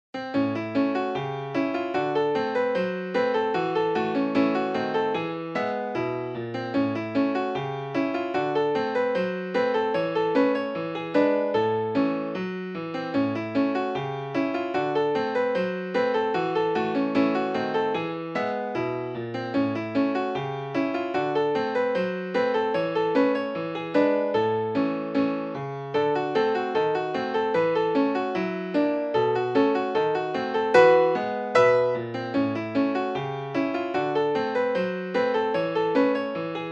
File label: petit rag; Chatalain Nicolas